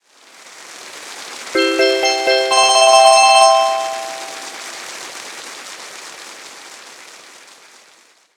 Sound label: win; RS Games Sounds; Farkle